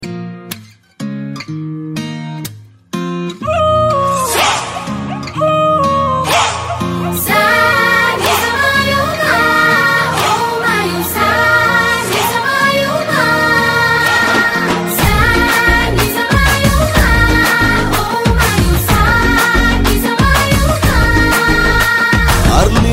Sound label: Early Morning Ringtone